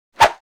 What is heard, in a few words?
swish single; crashes swishes impacts; Asian Show Sound Effects